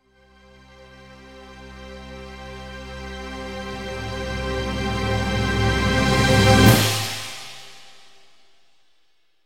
Orchestral Athmosphere Ringtone

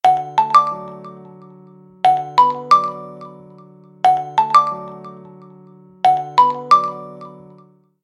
OnePlus Quiet Ringtones

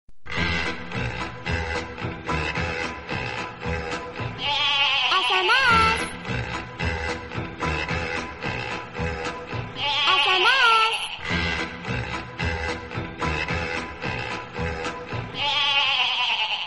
Oppo A16s Sms; Device : Oppo; Model : Oppo A16s; Oppo A16s Sms/Message Ringtoes

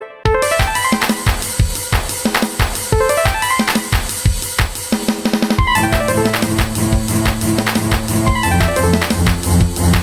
Samsung Galaxy A25 Ringtone

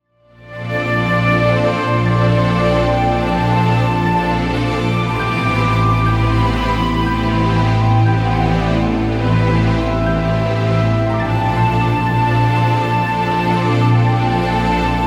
Oneplus Open Power Ringtone

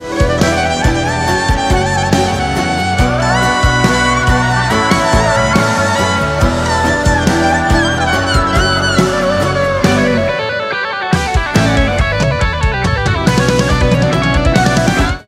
Samsung Original A36 Ringtone

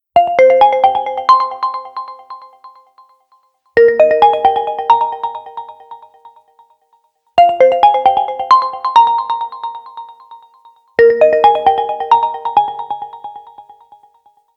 Oppo Find X5 Ringtone; Device : Oppo; Model : Oppo Find X5

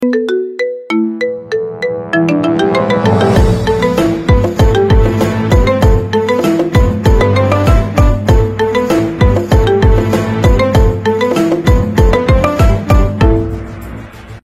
Remix iPhone Ringtone

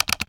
Notification; Samsung Galaxy; Samsung A54; Samsung A54 Notification Ringtones

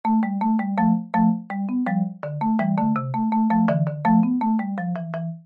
Latest iPhone Ringtone

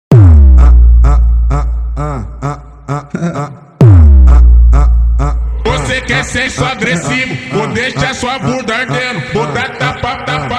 MONTAGEM FUNK Ringtone; English

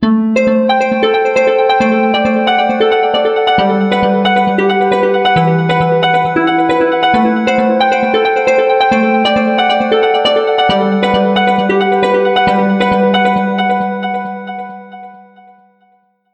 Oppo Reno6 lite Ringtone

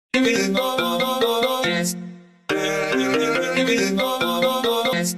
iPhone Ringtone

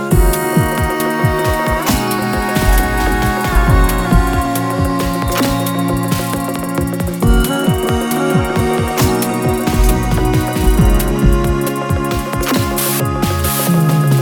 Samsung Official M52 5G Ringtone

